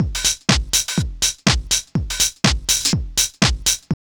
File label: house loop